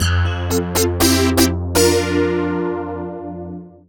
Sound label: CMI PIANO; Wizoo - Powered DX